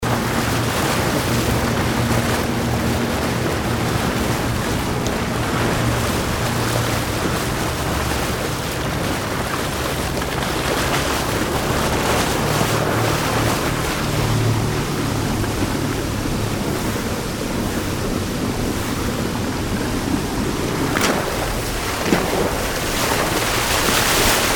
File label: boat water taxi moving bow water displacement